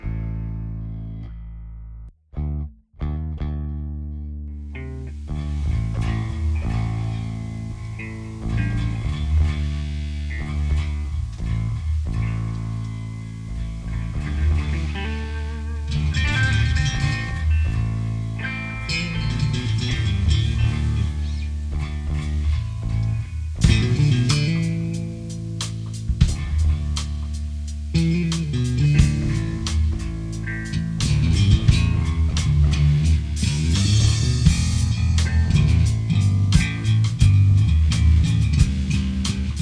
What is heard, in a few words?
Double Bass